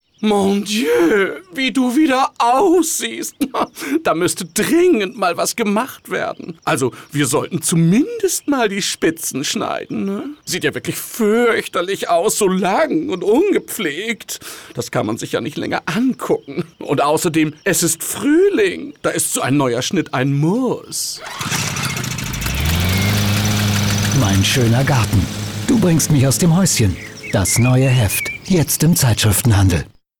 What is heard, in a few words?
funk; FRISEUR